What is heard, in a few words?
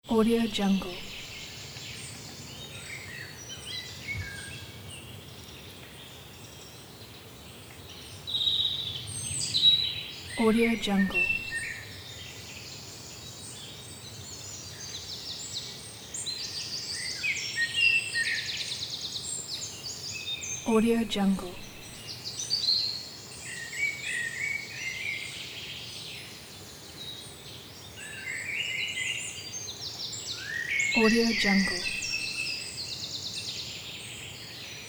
forest birds